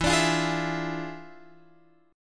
E 7th Flat 9th Guitar Open F, chord; E7b9; strummed

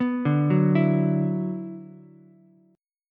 D 6th Add 9th D, Ukulele, C6; D6add9 arpeggiated; 4,2,2,7; JGuitar

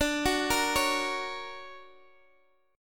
D Augmented Major 7th; arpeggiated; chord

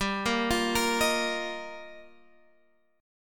Eb Suspended 2nd, G; arpeggiated; chord; JGuitar

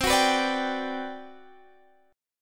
G Major 7th, C; Gmaj7/C; strummed

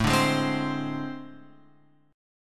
A Minor Major 7th Double Flat 5th; AmM7bb5 Chord {5 5 6 5 X 8} strummed

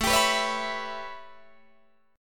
A 9th A, Guitar Open D

